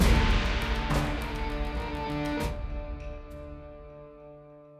Stinger; Naan Barrel Start

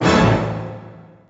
hit, pinball; Excalibur

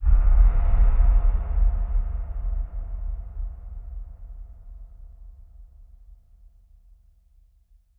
enemy assassination stinger

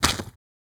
run gravel

